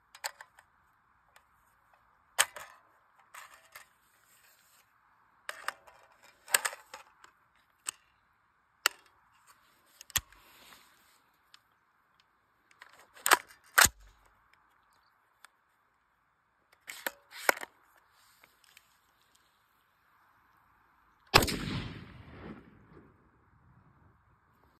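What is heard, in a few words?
M4 carbine, gun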